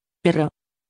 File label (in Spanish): perro